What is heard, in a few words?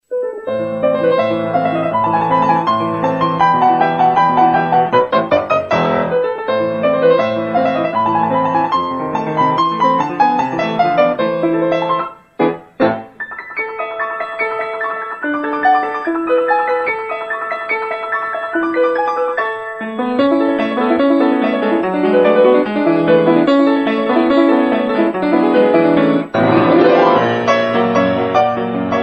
Piano Tone